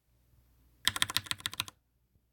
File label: no ring fast